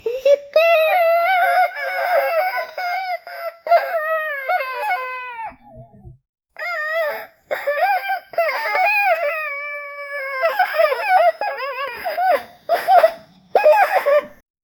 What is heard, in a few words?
playful giggles and excit